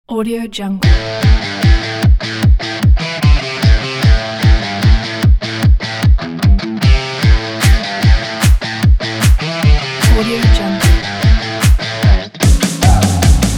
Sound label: uplifting energy pop rock; owlstockmusic